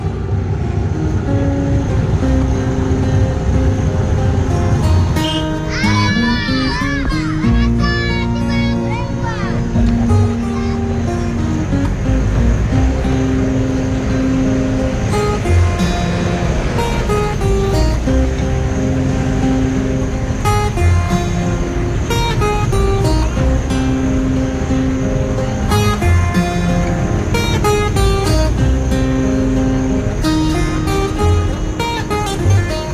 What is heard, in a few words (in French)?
Musique malienne